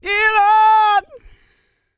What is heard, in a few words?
GOSPEL